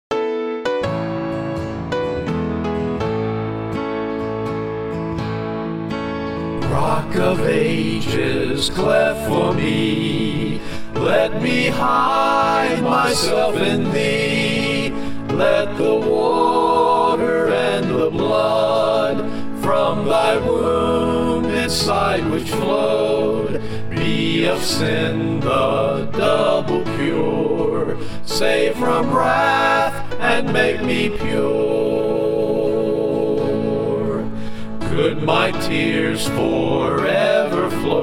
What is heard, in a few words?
Rock of Ages Vocal